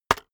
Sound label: keyboard external enter hit very fast